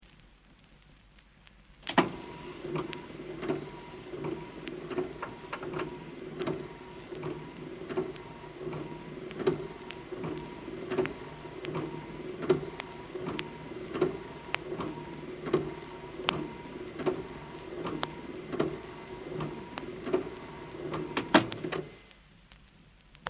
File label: record wipers